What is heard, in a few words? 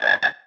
ribbit; blackshoot